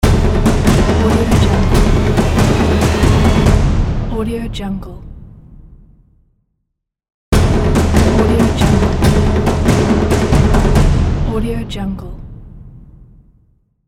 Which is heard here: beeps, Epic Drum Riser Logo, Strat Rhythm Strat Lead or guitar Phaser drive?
Epic Drum Riser Logo